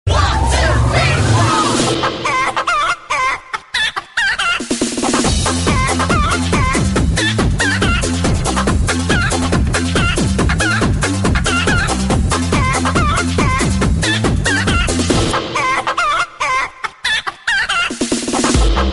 bgm murga funny ringtone

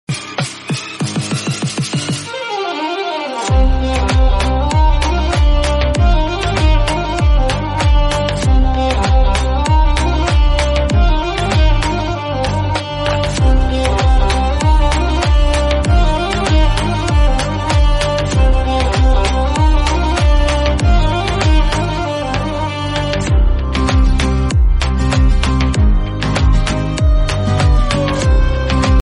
relaxing hip hop background music tone; iphone ringtones

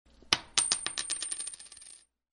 dropping coin sms ringtone

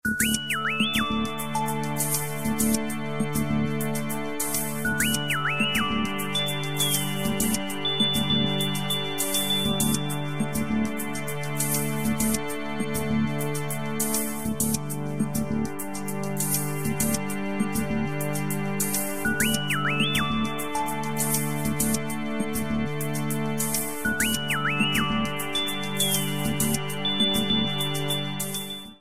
note 2 alarm ringtone

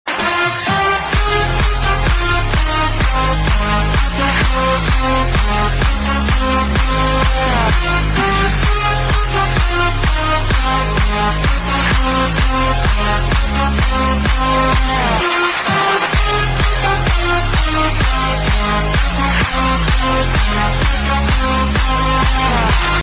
bass boosted

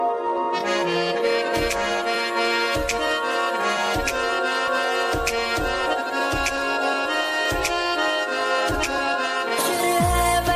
very sad music ringtone, bollywood

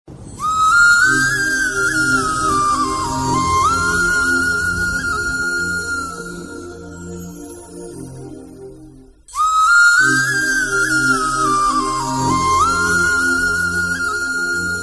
lovely romantic background music tone

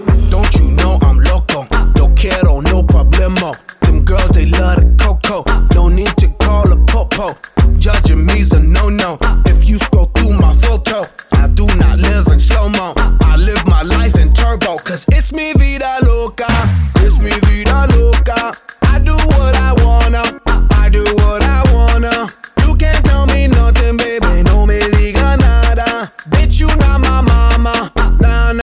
english song ringtone; english ringtones

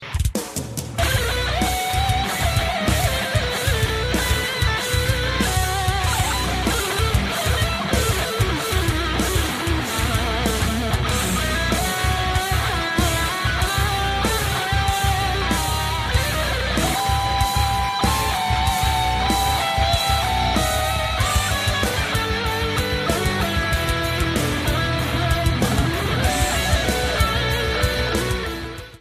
metal ringtone

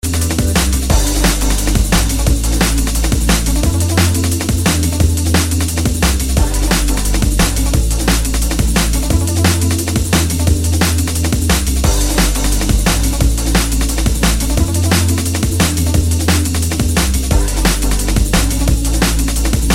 fast music background music tone ringtone

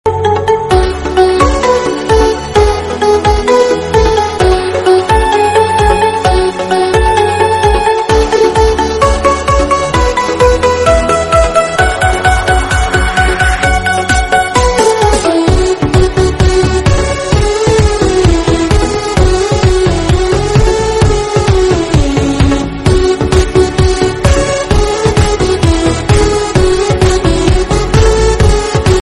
remix music tone ringtone

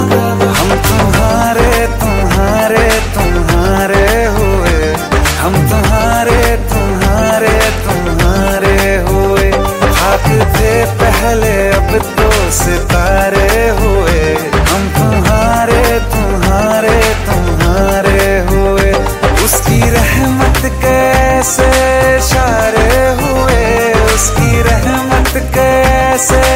new hindi ringtone

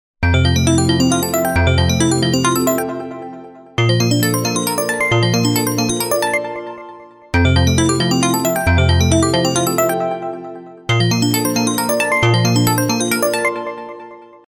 oppo ringtone; finding oppo; samsung ringtones

